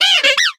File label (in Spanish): Grito; Glameow; sexta generación (XY)